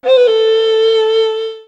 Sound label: JAPANHEALER attack; Eft